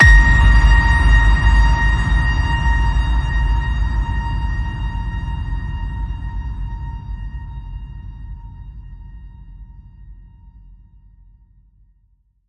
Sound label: Ping Of Doom, A sharp